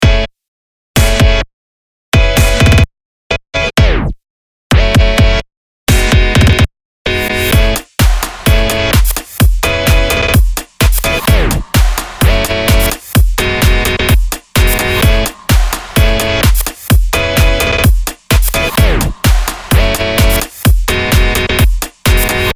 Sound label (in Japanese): バウンス